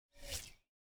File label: archery, firing, loose, bow, midflight, wide, mythic, sleek, swirl, rattle; Nocked; Vaeyan bow; Eiravaein Works